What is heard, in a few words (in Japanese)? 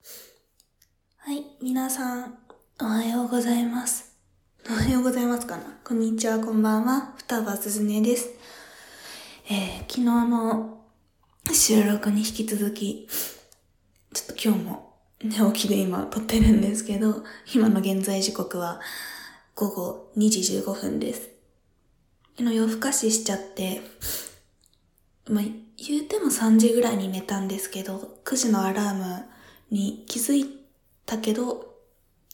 おしっこ実演 お風呂でアルミホイルにおしっこ; 放尿